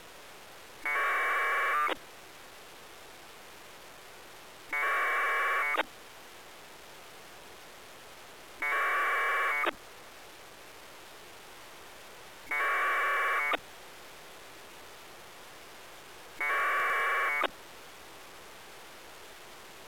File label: AFSK